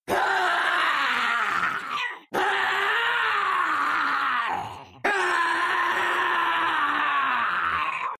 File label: reaper screeem